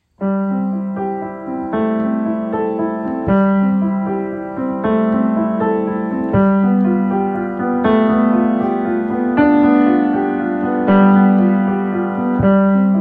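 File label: Mission bells, Piano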